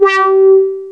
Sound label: WAH; East West; Global Sounds